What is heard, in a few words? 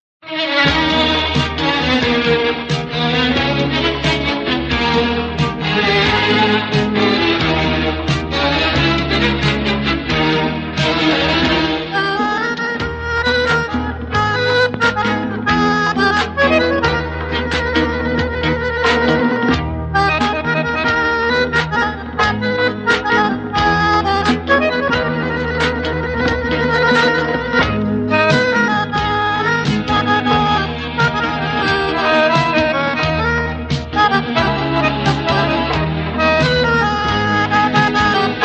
Accordion